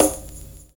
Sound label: PERC THEA; Univers Sons - Kitch 70s